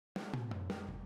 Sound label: Boogaloo - Room M, 82 BPM; Drums; Intro; Sonic Foundry; Drums from the Big Room The Mixes